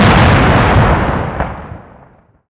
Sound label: EXPLOSION Distorted Long stereo